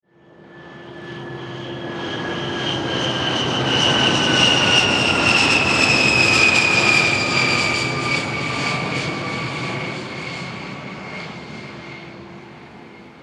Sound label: Jet Pass By, Warfare, Jet, A10, Pass, By, Fly; Miscellaneous Combat; BlastWave